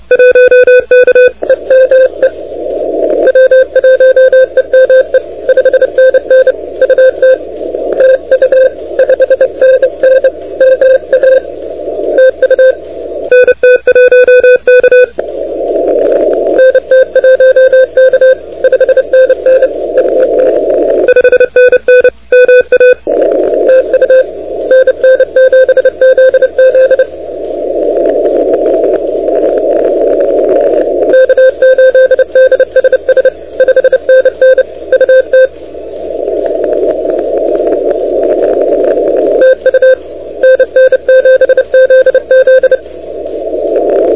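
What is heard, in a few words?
continuous wave 28MHz; ARRL 10M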